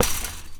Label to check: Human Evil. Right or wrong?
Wrong